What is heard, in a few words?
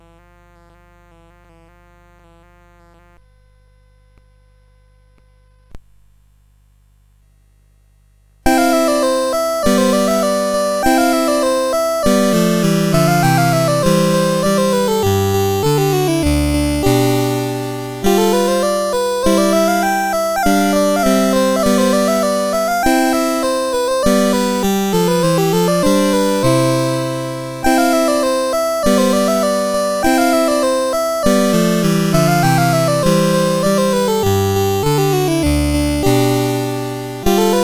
CSG8580; Sonatina; CSG8580R5